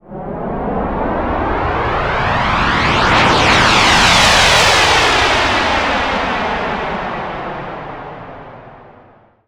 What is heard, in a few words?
atmo, FX; Ueberschall; Techno Trance Essentials